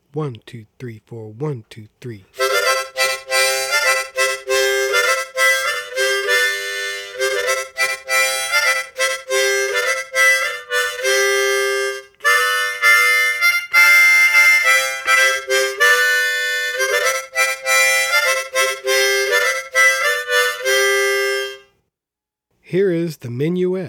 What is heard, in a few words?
Oh Suzana Fast